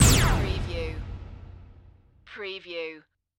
Laser Hit

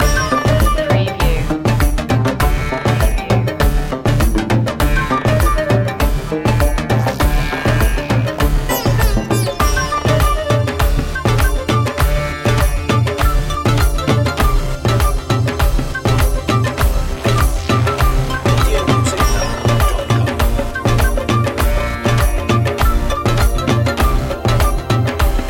funky india

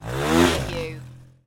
Motorcycle Dirt Bike Driving Off Jump

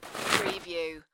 Snow and Ice, Slide on snow; Weather sound effects

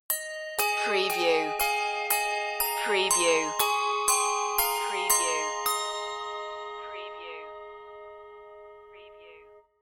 handbells; wassail wassail

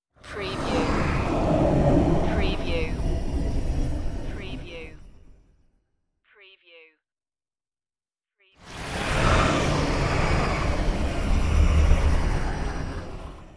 evil starship flybys

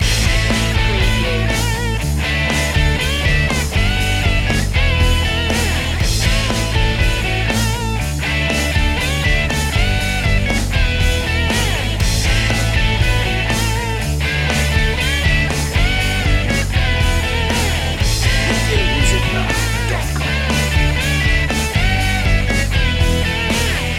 loop

